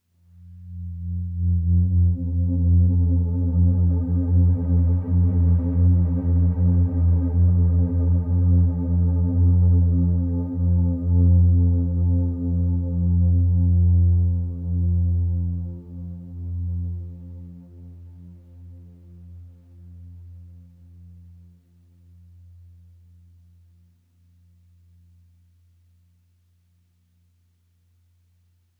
Drone; Martian Chess Audio

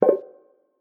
Menu Select; Martian Chess Audio

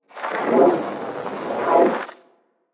Piece Captured; Martian Chess Audio

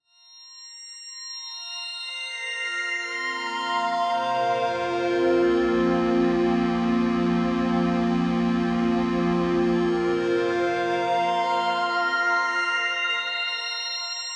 Chord Ramp F; Martian Chess Audio

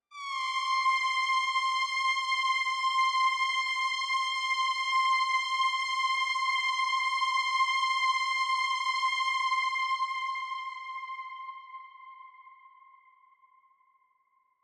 Lead Synth; Martian Chess Audio

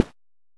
footstep grass

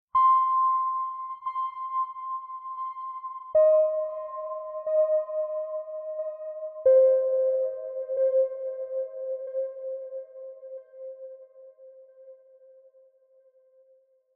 Pings; Martian Chess Audio

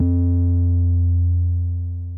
Drum BPM110; Martian Chess

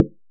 impact Bell heavy

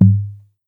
Mallet on GTR

